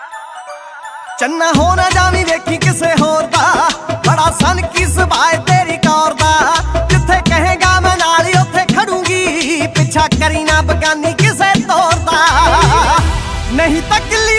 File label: Punjabi Song Ringtone